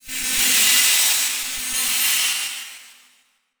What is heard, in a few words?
Transition Feedback Distorted; Adobe; Production Element